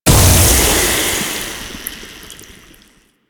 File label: Toxic Ground Blast; Warframe; Infested; Ancient